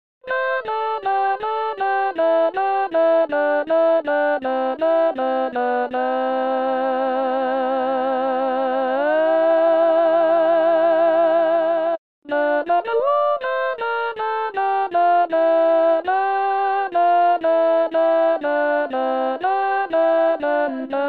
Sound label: SATB Alto 1